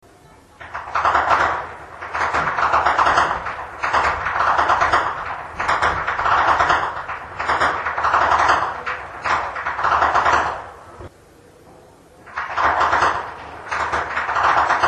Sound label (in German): schnalzen, flachgau; maxglan